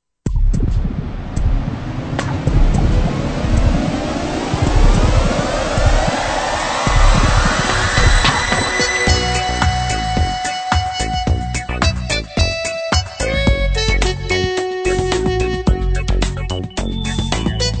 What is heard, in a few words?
Funky Groove